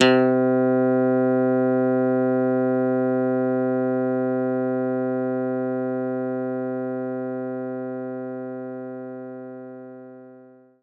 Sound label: Pick Bass A0